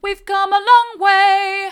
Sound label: we've come along way mid, vocal